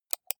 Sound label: computer mouse right click